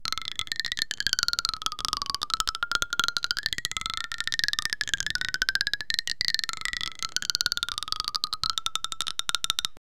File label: a bone xylophone sound, percussive